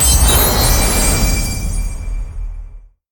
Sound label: ui legendary item toast; pretty lootalert